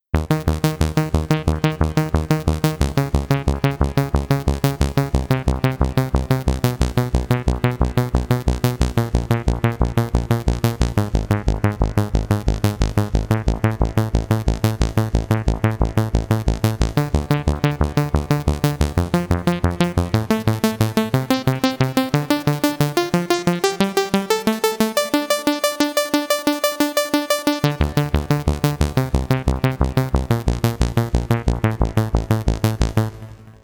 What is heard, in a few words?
arp saw trio; the transmitter soundset